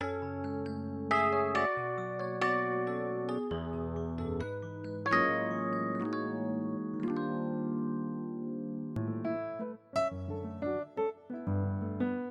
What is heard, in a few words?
Stage Keys